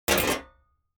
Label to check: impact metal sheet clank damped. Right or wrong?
Right